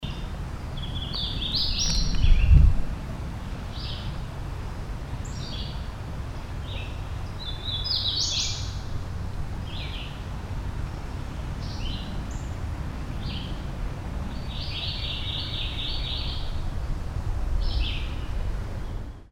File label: Hooded Warbler Alternate, Carroll MD